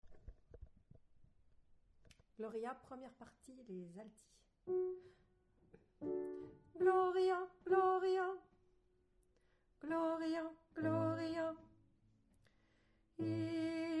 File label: Alto; Vivaldi - Gloria - Gloria